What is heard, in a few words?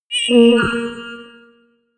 notification sci fi accept; kommunikation; signale